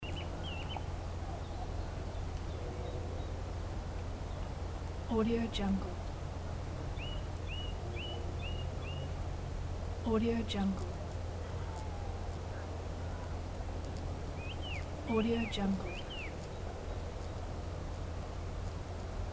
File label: suburb ambience loop